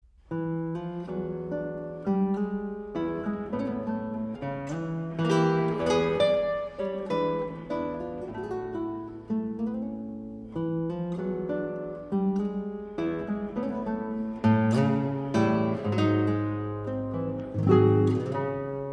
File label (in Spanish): guitarra